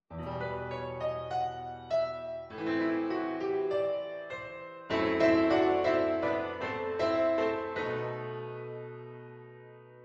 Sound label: TENOR